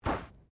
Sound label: Body movement Slide; Body movement foley to simulate falls and deaths; Body Sound Effects; Bodies (Sound Effects:Human)